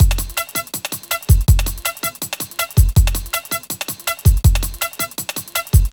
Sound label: 162, breaks, Loops; M8